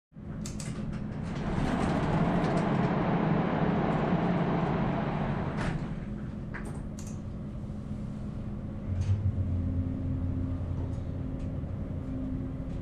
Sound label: Manufacturing Engine Turned on